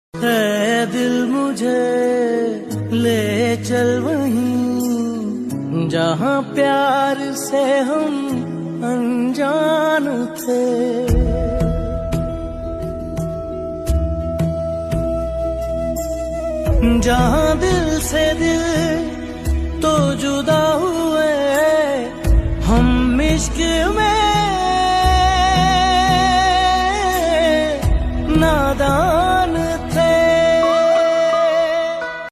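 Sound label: original soundtrack Pakistani ringtone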